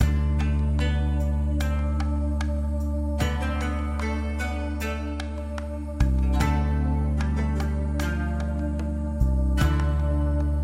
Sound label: idle theme